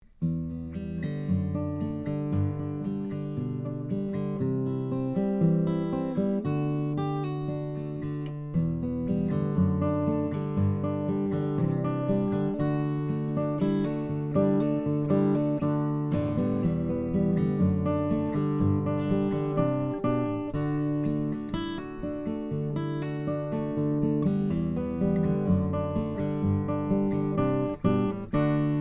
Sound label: fingerpickin; Avenson STO2